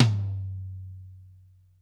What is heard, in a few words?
TOM, brush kit; Giga Samples Collection